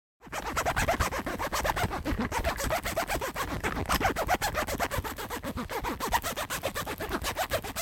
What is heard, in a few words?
Zipper remix